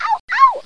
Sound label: ouch